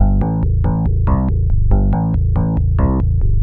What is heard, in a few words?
TECHNO BASS 140 A